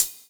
Hhat, HiHat; Equipped Music; Loungin House

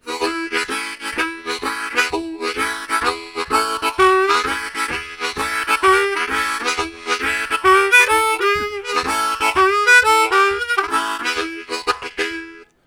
Falling Boogie, Harmonica; Zero G

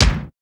Fairlight CMI III Kick

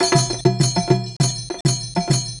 Belly Dance Tabla, Acoustic Beats; Sonic Foundry (Sony Creative Software); Myths of Technology

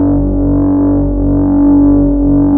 MEMORY C3, MEMORYMOOG, OLD SYNTHS; Rubber Chicken Software Sample Bank

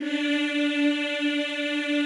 EEH D3, Classical Choir; Best Service; Extended Classical Choir

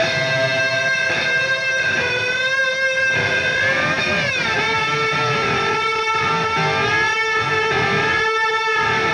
Clinical Slide Delay Guitar; Sonic Foundry (Sony Creative Software); Indie Rock Anthems; Session 02 - Clinical

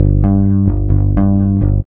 REAL BASS, BASSLICKS; Ueberschall; Jam Box

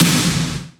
big snare; M8

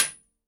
metal, handy percussion

